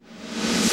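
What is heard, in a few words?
Roland R-8 backwards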